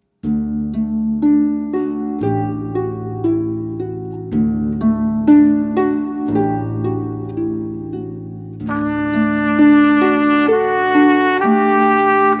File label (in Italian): ARPA TROMBA; Offertorio